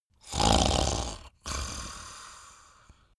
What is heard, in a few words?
chattering snarl; koerpergeraeusche; menschen; soundpool